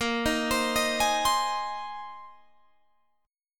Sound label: Bb 7th Suspended 2nd Suspended 4th Bb down Guitar Open G